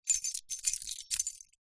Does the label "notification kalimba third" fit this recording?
No